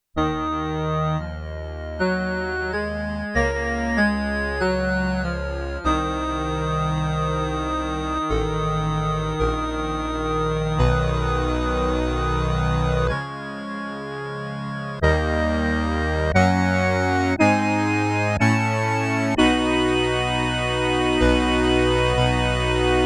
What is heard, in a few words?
PIPE ORGAN, SIEL DK 80; Factory